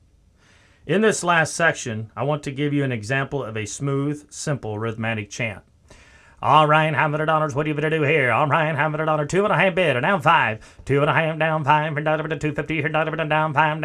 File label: Rhythmatic Chant